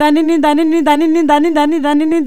Rif At Sa Ni, Vocals Female; Sonic Foundry (Sony Creative Software); World Pop

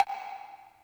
Woodblock L, Yamaha PSR E305

